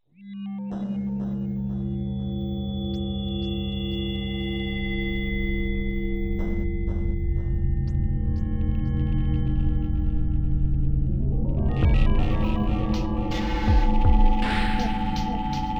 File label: yamaha dx 200